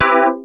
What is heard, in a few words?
dx7 Synths